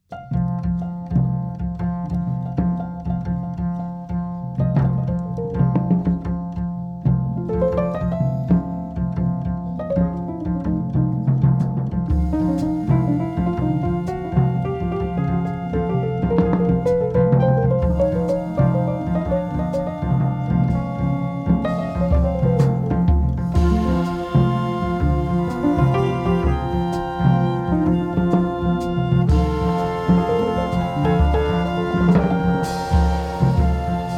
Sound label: Swing Band Folk Song